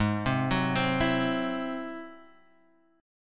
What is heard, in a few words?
G sharp Augmented 7th G sharp, down, Guitar, Drop D